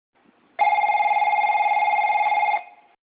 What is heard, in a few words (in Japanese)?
発車ベル; 福工大前; 音程が高いベル